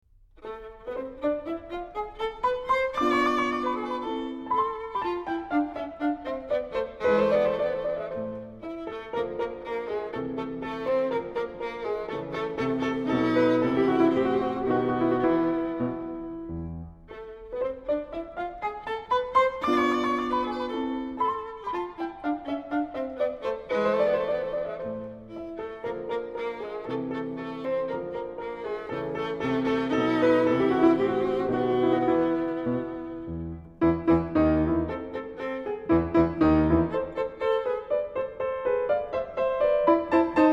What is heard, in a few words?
Violin Sonata in A, Variazione V; Mozart; K305 293d